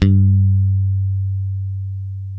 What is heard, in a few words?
G 3, ultra pick, bass; Spectrasonics; Bass Legends CD 1